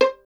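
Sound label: STR violin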